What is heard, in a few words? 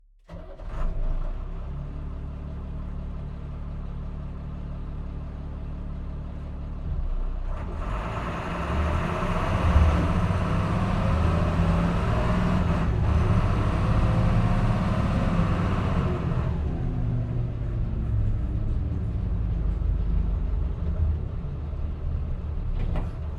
VAB onboard fast driving gearshifts rear compartment interior, APC Renault VAB; MKH8020; Pole Position